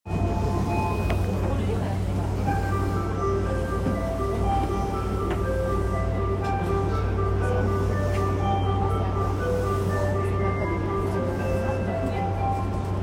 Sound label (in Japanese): JR 山手線 鶯谷 CW, train sounds